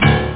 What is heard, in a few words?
BombSet; amiga; DiamChall